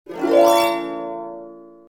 harp

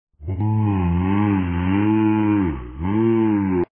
bwah

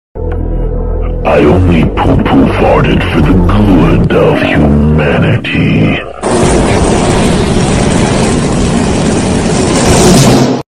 poo poo fard

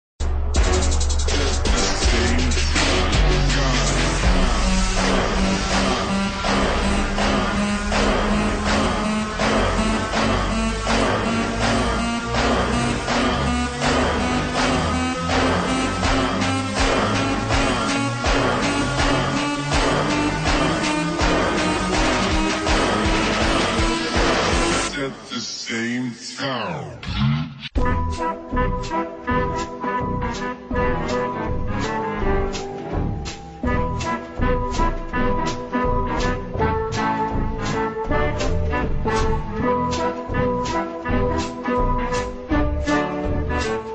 bass drop

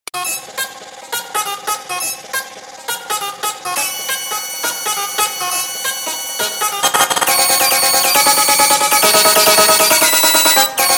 honking

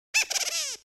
wah

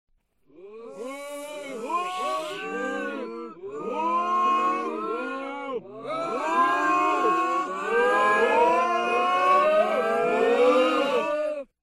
boo, reverse

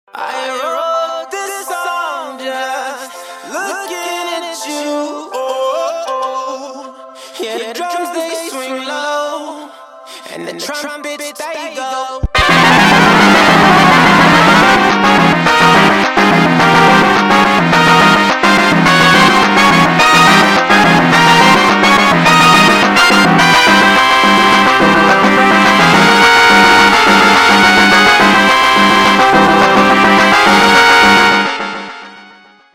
meme trumpet; cave

